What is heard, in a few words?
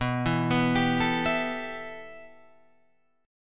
B 7th Suspended 4th Sharp 5th, Guitar, Open F; B7sus4#5; arpeggiated; JGuitar